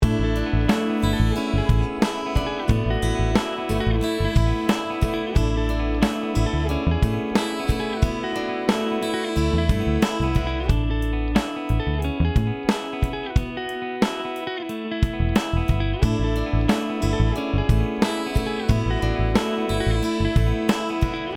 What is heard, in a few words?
WARM AUDIO BUS COMP